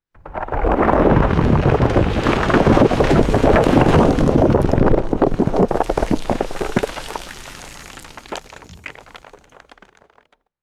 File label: medium rockslide tumbling and rumbling